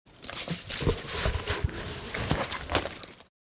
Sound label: Bulletproof vest put on cloth rustle; Military Equipment